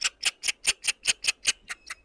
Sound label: animal; DAoC; Toa